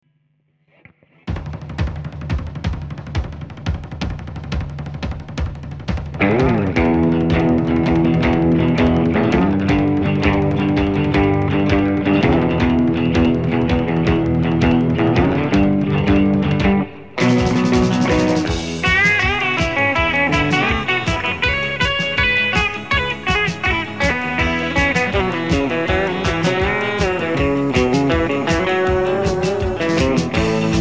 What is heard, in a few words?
driving guitar